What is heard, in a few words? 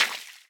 stomp water start; Scrat